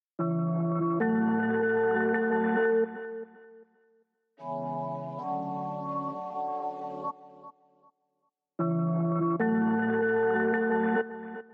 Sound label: bells; of belleville